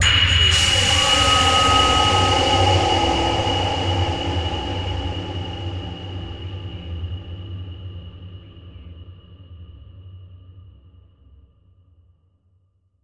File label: Hit Metal